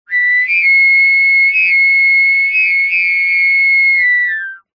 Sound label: foley pipe plastic tube air blow through unharmonic shrill musical tone